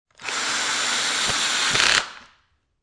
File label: Industry, Drill, Electric Drill Tightening Screw Into Wood, Quick